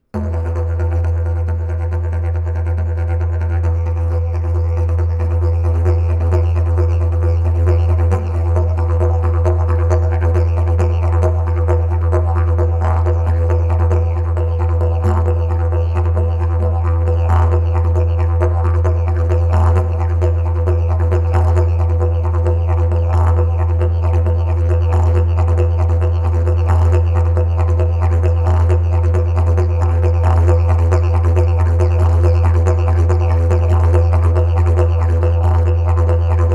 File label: didge